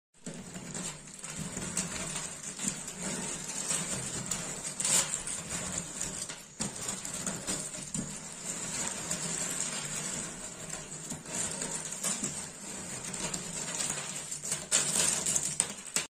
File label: Puzzle